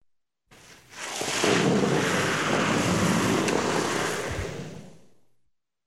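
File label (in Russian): кёрлинг гранитный снаряд камень скользит по льду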